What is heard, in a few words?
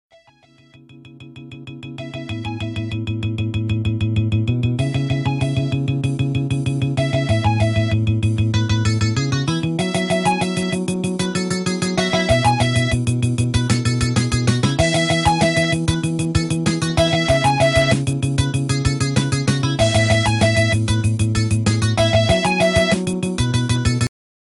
jingle